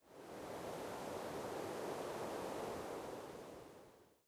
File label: ambient port default; World Of Warships